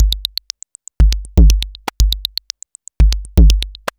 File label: Anabeat Mix, Beats